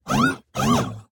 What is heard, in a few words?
disagreeing beep; 堡垒（守望先锋2）; 否定